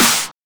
Snares; Vengeance - Essential Clubsounds Vol.4

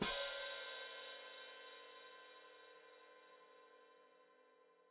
Hat Closed Open, Hi-Hat

